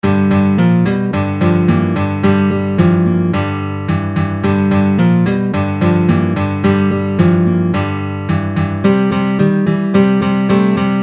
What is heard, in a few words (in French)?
Guitare